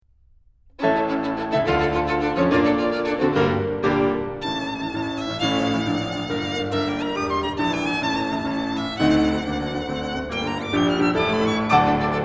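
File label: Brahms D minor violin sonata last movement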